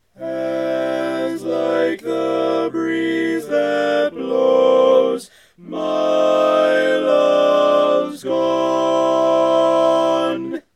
Tenor, barbershop tags

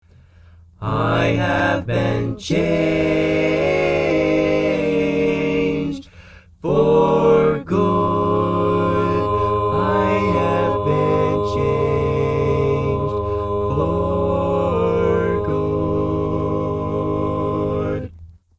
Bass, barbershop tag

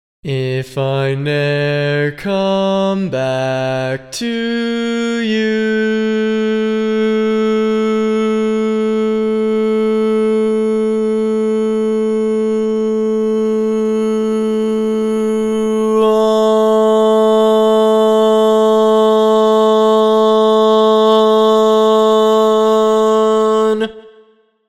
Bass, barbershop

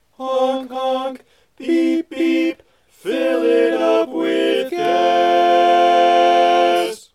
Honk Honk Tenor, barbershop tag

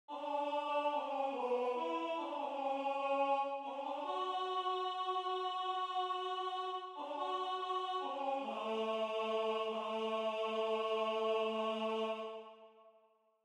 Bari, barbershop

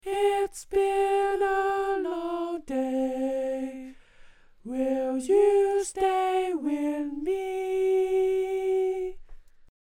Tenor, barbershop tags

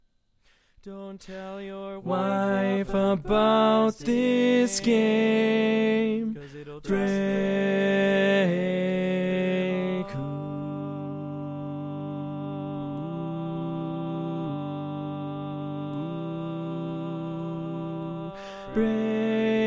Bari, barbershop tags

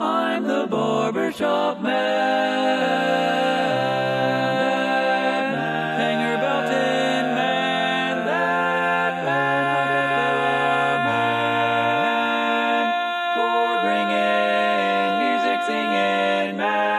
Lead, barbershop; TTBB version

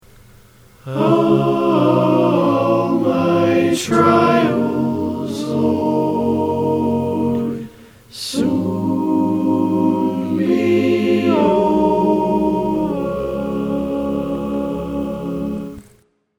All Parts, barbershop

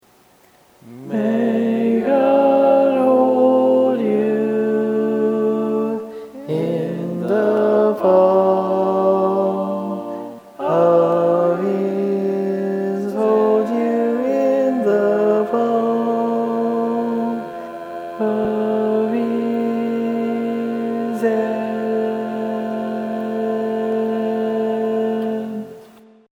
Lead, barbershop

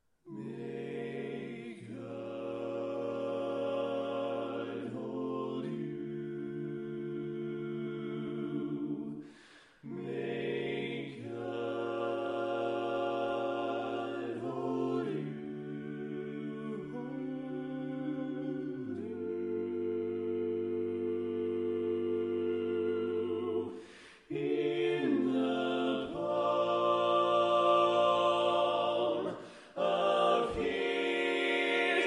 All Parts, barbershop

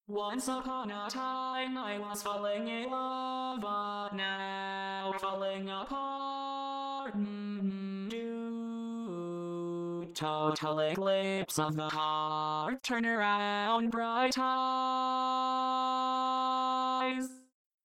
barbershop tags

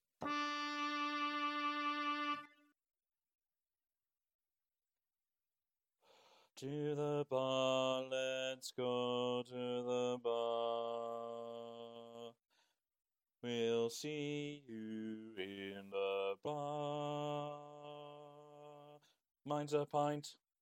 Bass

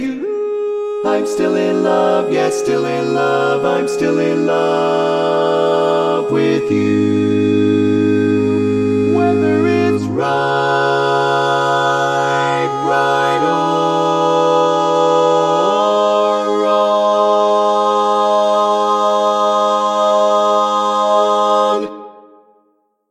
All Parts, barbershop tags

